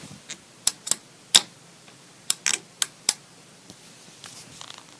light Switch

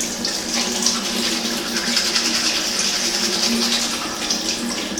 brushing Teeth

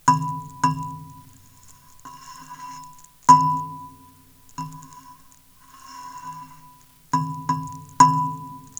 railing

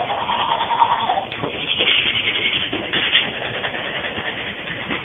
brushing Teeth

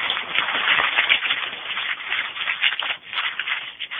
crumble Paper

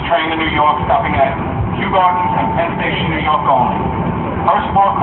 daily Commute

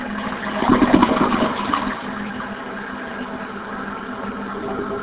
toilet Flush

